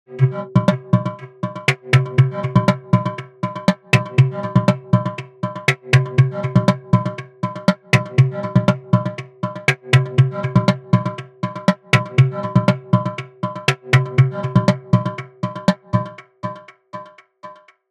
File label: delay echoes false start wet; aas; objeq delay